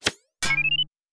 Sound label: hit wrong answer